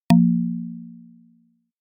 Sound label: Error Wooden